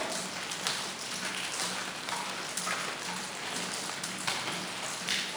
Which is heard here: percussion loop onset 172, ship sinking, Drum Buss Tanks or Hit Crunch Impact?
ship sinking